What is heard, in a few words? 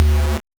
Noise; musicradar; 8-bit bonanza